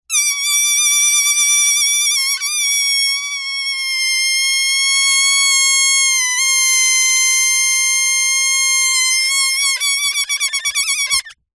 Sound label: foley balloon air release slow squeak